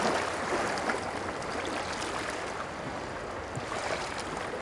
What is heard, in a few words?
Golf Crowd